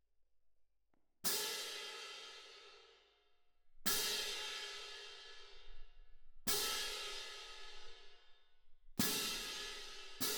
Cymb Main

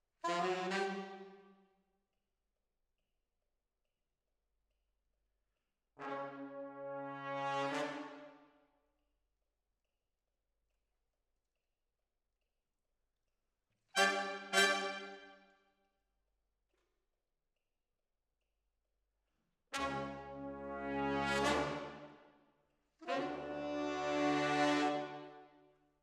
Decca, Jazz for piano

